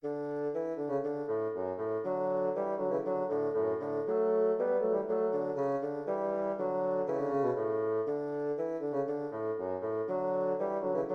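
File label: allegro bassoon duet; handel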